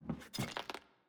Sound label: Footstep Plates Rotate